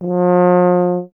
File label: BRS TUBA, Solo Instruments